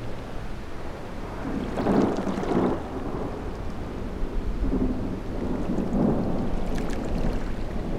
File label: lake waves